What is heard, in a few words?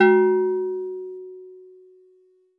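Steel Drums DX100 F#3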